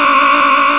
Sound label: KLAXON; JOHNNY